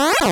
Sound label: error